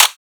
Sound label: clap; FL Studio; slayerx drumkit